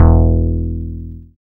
BAS MKS-50, Roland

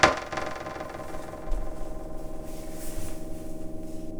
loop, techno atmos

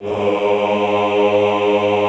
AHH G1, choir; Best Service; Extended Classical Choir; AHH MIX

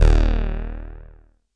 JUNO BASS

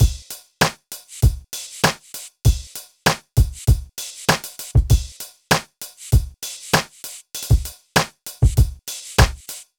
Drums, Hip Hop

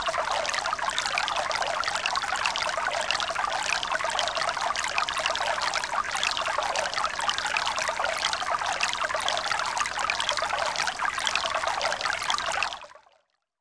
Stream; Roland BK-5

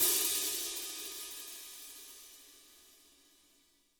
HAT, WARM+FAT KIT; Spectrasonics - Burning Grooves CD2

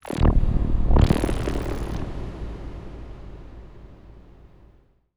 Virus wet phartt

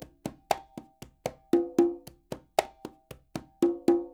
NYC Percussionworks loops; Sampleheads

